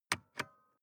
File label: sports car int switch press and release; autos; verkehr transport